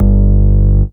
Bass Shot G; Mutekki Media; Vengeance Electroshock Vol.2; Bass Shots

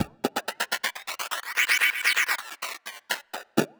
swoosh; Loopmasters; Funky Underground Vol 2; FU2 SWOOSH FX

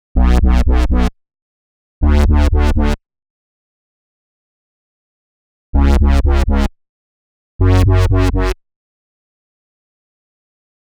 129 Bm Bass, Complex Electro Synths; Prime Loops; TranceOut

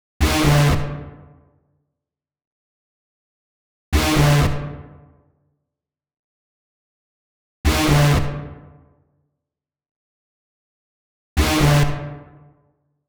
129 G Synth, Bpm129, Complex Electro Synths; Prime Loops; Androids

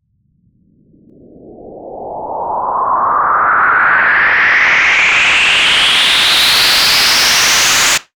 FX Uplifter

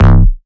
Bass Shot C

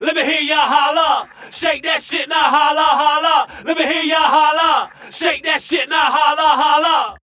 VOX

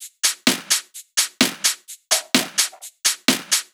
Loop, 128 BPM

